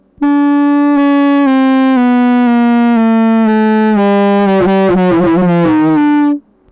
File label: sax down